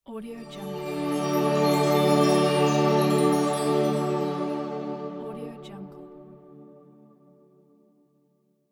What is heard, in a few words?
magic aura